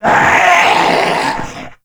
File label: Mariner Zombie Attack, Vocals